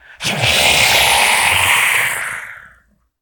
alert close, horror; slender

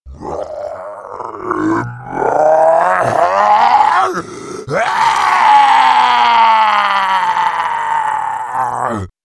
moan; slender; zombiebm

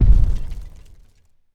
garg step; slender

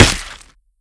hit; slender; trypophobia

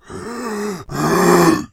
alert; slender; zombiebm

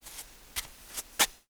slide; slender; zombiebm

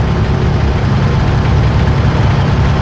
idle, armored car

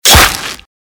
attack claw hit; slender; amnesia

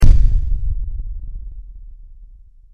step; slender; hellknight; foot

